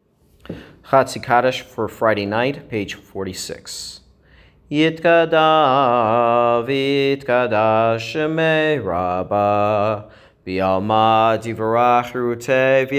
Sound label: Hatzi Kaddish